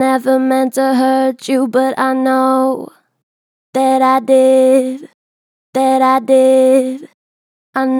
Vib Never meant to hurt you, Vocals, Natural Vibrato Strong; Harmor Vocals